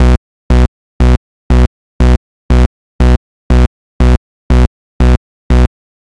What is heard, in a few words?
an audio for a 7 second, countdown